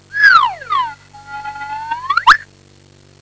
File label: slide whistle; Sound Effects for Multimedia - Volume 1; instruments various